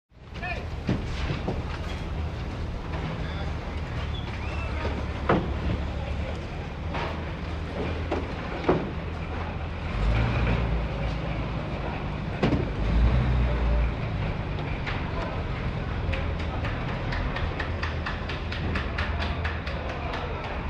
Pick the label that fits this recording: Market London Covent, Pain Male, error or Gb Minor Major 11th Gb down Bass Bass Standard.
Market London Covent